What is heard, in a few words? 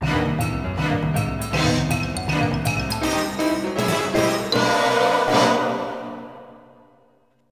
Speed Puzzle